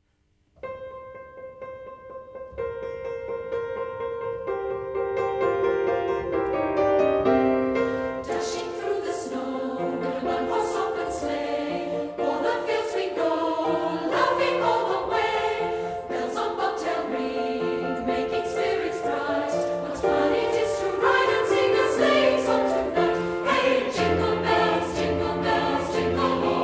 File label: Jingle Bells Concert Choir; Brisbane Concert Choir